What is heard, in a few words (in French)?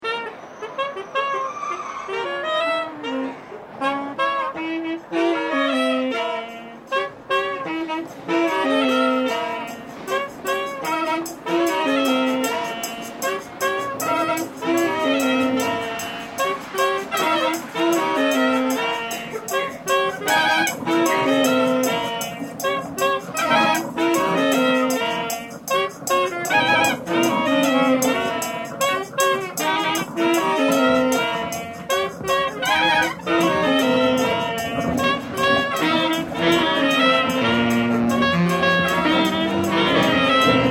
Fanfare; Ledepart